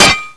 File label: wrench hit; svencoop